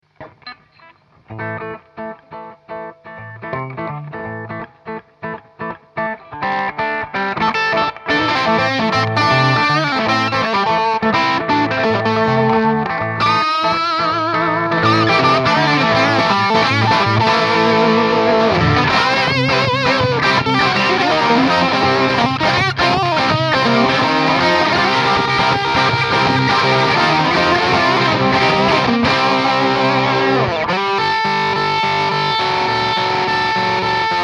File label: Les Paul full driven